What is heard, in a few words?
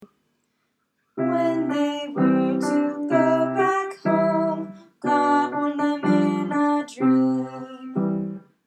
recitative